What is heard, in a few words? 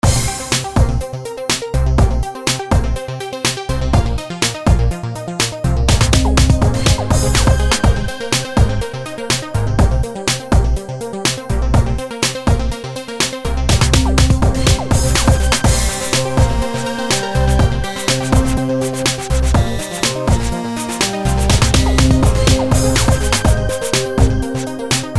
Short melody